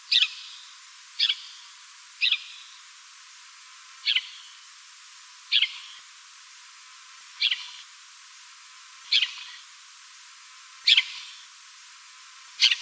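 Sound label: lesser grey shrike voice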